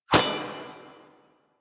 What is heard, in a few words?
Rising Blades Sword Impact A; Warframe; Warframes; Pax Duviricus